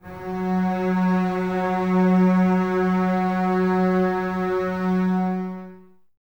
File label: Basses F#3, String Ensembles; Miroslav Vitous; 90 s Sample CDs